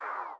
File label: slider down; Inception The App